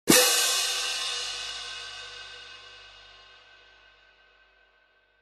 16in Classic Orchestral Selection Medium Light Pair, cymbal sound